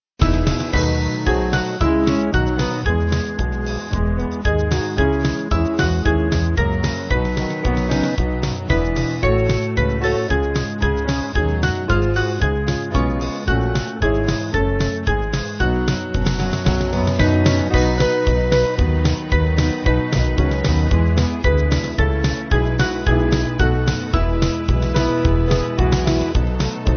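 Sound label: Band, Music Backing Files for Churches; Rejoice Ye Pure; Marion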